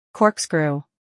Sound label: corkscrew